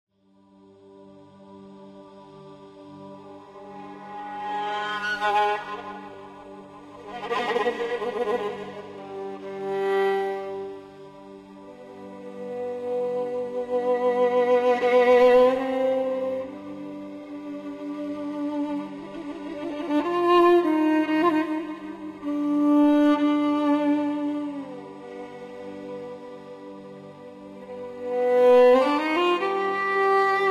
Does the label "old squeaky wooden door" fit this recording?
No